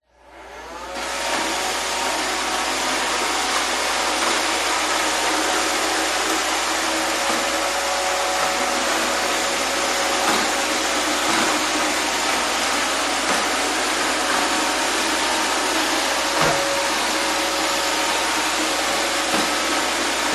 household vacuum robot operate vacuuming tiled floor room perspective